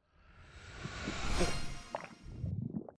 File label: Heal Trample; Goddess of Trampling